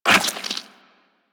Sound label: Step Goo, Footsteps Walk; Warframe; Tenno